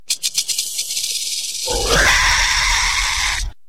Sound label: Dilophosaurus Roar Sound Effect; jurassic hall